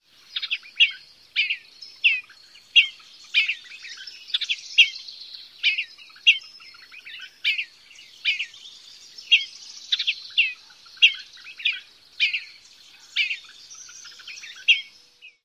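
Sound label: song, Western Bluebird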